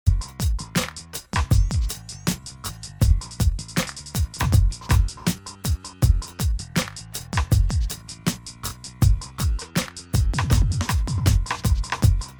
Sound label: Multi Comp